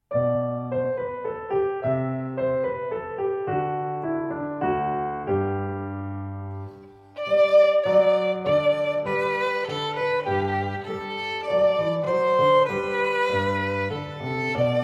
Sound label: Overture in G Minor BWV 822 VII; 80 percent